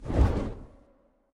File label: wingflap; smite; thanatos